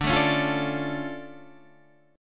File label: D Minor Major 7th, D, Guitar, Half Step Down; DmM7